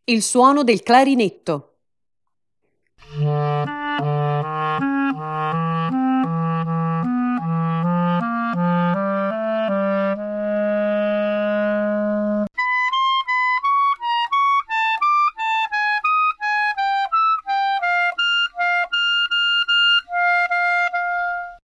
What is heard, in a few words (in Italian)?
clarinetto